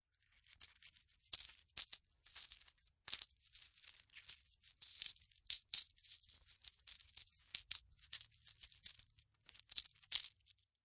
Coins Moving In Hand, Coin handling. Coins being moved around in hand.; Slot Machines